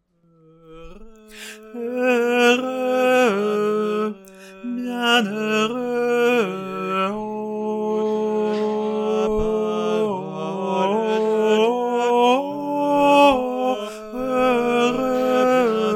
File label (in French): tenors